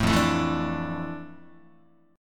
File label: F Major 13th Guitar; strummed; JGuitar